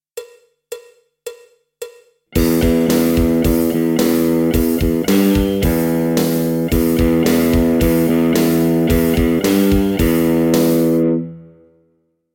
Metal-Rock Lick, E-Gitarren

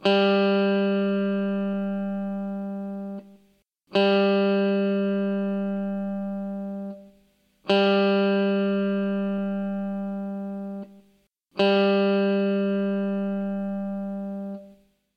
Stimmton g

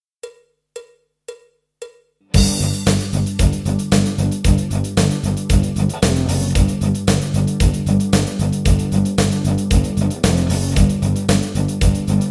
Rock Playback

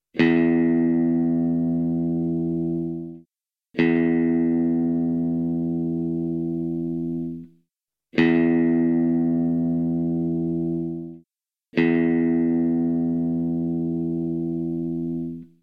Stimmton E

